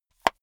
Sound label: watch case small close hard